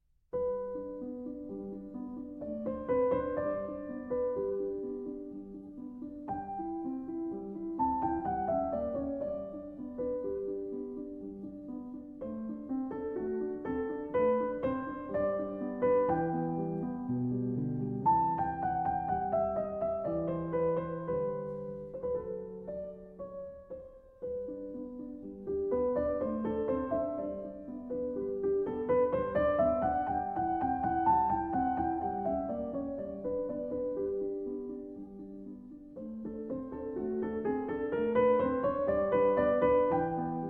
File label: Piano Sonata No. 16 in C Major; K. 545 Sonata facile; II. Andante